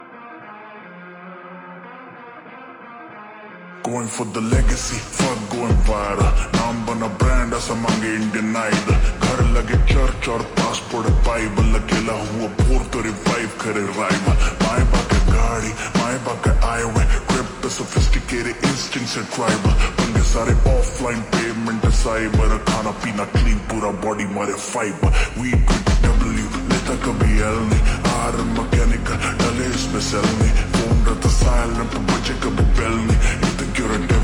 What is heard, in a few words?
Slowed Reverb